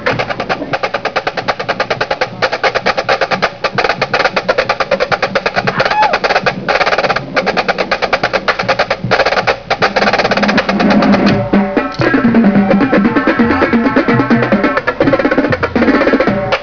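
Day Danse- This is the solo that the snares play on the plastic heads during the show, except they don'thave the heads on here.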